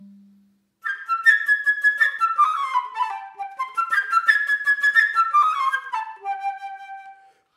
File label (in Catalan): Flauta travessera